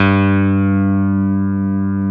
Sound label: E.Grand; Roland; Keyboards of The 60's and 70's